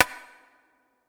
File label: click; ichni音效